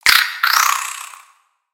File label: Spider Die; creatures mechanical; Lego